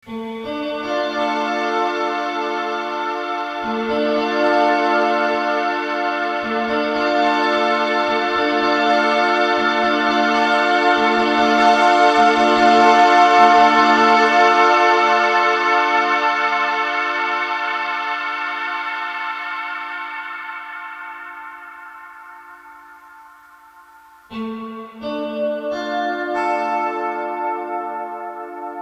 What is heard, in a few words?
room